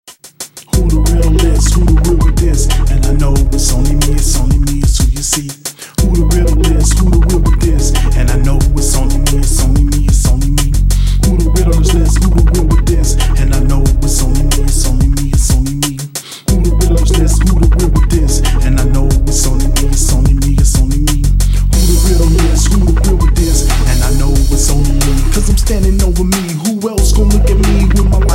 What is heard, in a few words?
hiphop